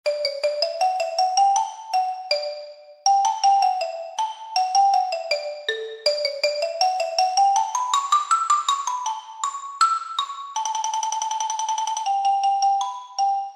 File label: mallet